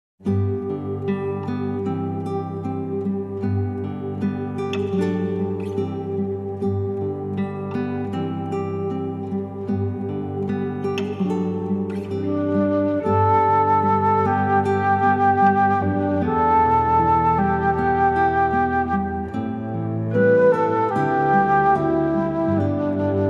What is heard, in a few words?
acoustic praise, instrumental